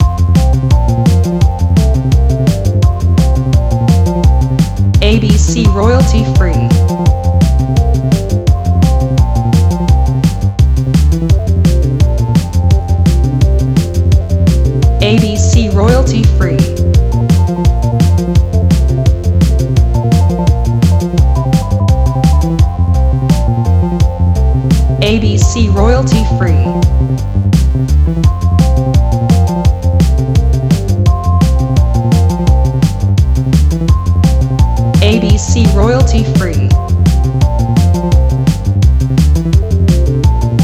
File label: Game loop